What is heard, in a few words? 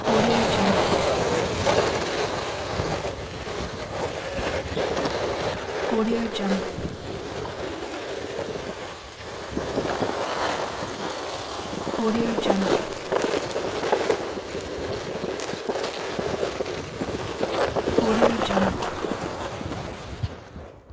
riding sled